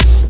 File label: Jump Kicks, Bassdrums